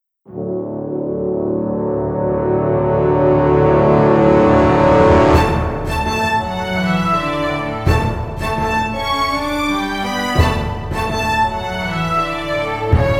British Travel Music; Pirates of the Burning Sea

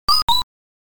Chime; Tower of Druaga; arcade

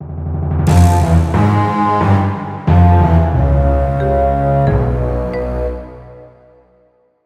Outdoor Battle

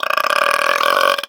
Huge Burp

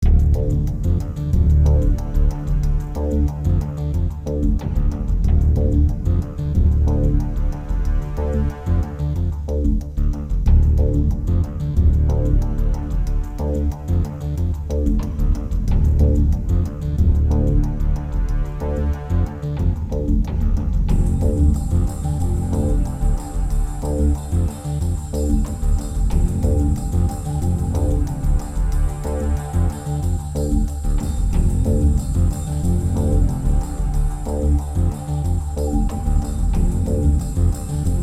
Alarming News Full

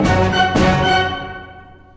F sharp 16th Oct x2; torchlight iii; ps4

